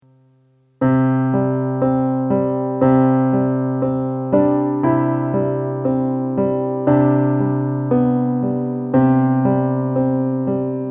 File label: solo piano